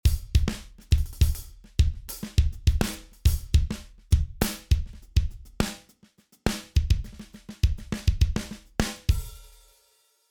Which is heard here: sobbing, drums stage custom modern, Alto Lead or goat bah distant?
drums stage custom modern